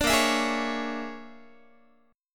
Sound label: Bb 7th Sharp 9th Flat 5th Bb, Guitar; Bb7#9b5; strummed; JGuitar